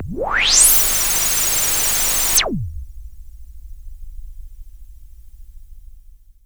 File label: SYNTH FX